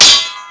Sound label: sword slide; Pirates Of The Caribbean; DUEL